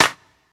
rap clap d sharp key; single hits one shots

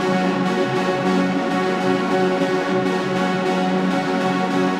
space texture poly arp; synth loops

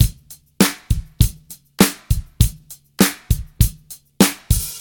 100 bpm drum beat d sharp key

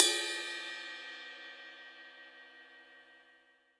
ride cymbal single hit a sharp key; cymbal drum samples

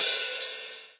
airy sizzle hat B key, Tuned drums

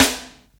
verby snare drum a sharp key; 90s; snare drum samples

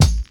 00s crispy kick drum single shot a key

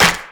dry steel snare drum b key; snare drum samples

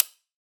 clear high hat sound d sharp key; hat samples

